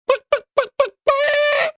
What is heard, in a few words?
Roll C; MOBICLIC 65